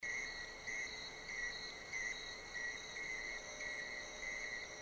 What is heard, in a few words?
noise, dark ambient